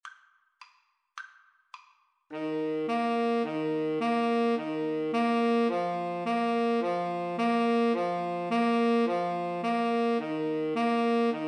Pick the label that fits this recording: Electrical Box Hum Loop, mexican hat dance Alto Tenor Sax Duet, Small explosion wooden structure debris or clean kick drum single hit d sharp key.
mexican hat dance Alto Tenor Sax Duet